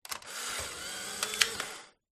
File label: camera polaroid instant take picture